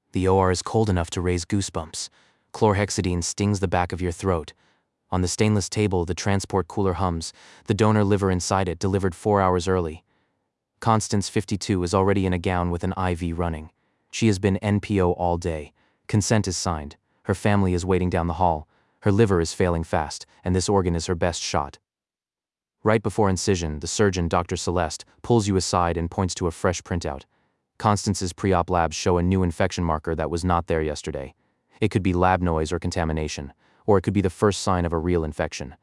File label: the organ that arrived early